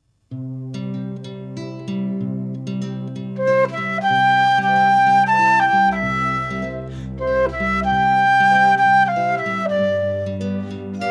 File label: Inst